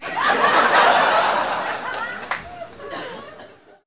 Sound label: Laugh